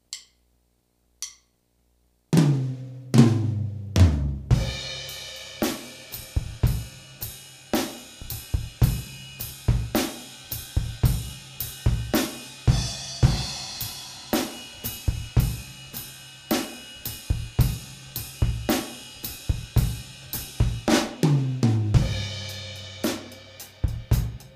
Rock Ballad, 110 BPM, A half-time 4/4 rock/pop ballad groove.; drum